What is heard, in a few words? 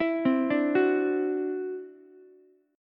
E 7th Suspended 2nd Sharp 5th, E, down, Ukulele; E7sus2#5; arpeggiated